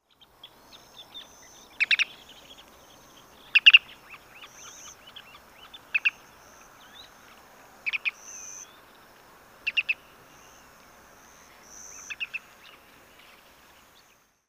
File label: Ruddy Turnstone, Arenaria interpres; shorebirds rails